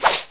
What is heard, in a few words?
slash; Grounch